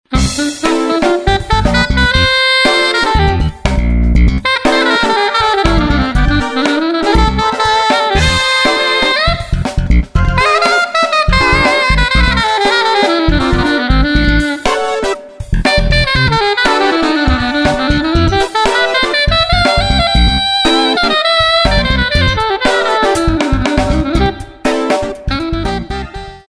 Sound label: enneatonic; blues